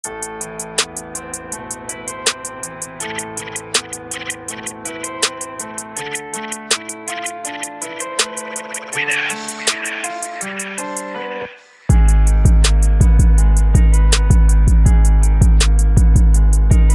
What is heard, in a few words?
Instrumental